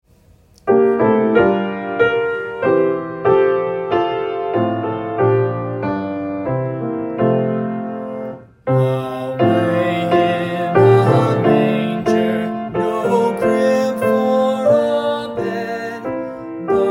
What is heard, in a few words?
Away in a Manger alt, choir; chapelchoir